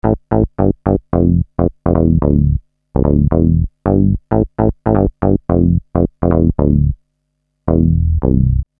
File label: Syn Bass 110 Am, Techno Synth Loops; Sonic Foundry (Sony Creative Software); Signals I